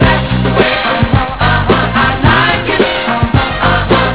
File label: ahaaha